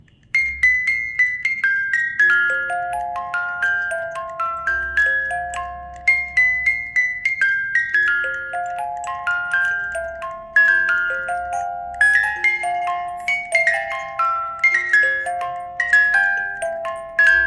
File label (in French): boite a musique